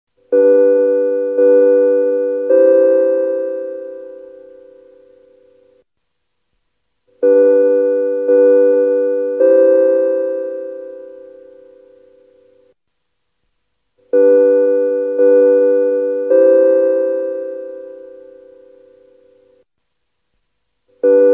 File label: Electronic Ident; BBC; Solaris